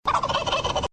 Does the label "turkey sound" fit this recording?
Yes